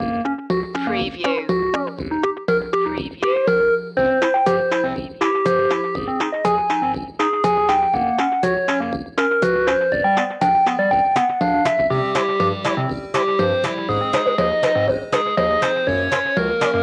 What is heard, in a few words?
8-bit boss